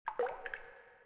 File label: drop, CAVE; AMBIENT; Pirates Of The Caribbean